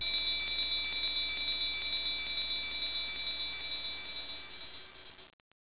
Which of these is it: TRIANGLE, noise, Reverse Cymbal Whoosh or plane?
TRIANGLE